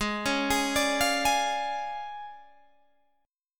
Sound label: D Minor Major 7th Flat 5th, G sharp